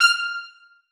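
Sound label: trumpets staccato e6; Sonatina Symphonic Orchestra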